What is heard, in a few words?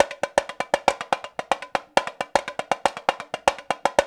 RIO, Rio Batucada